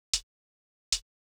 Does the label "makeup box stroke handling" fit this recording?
No